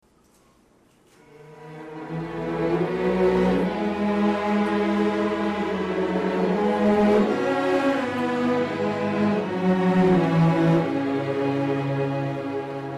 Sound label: Orch Irish Tune; Grainger